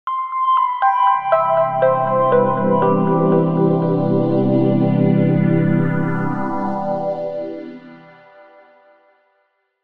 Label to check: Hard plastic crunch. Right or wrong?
Wrong